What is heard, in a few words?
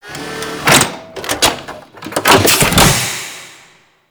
Energy Capsule Insert